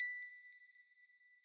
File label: plack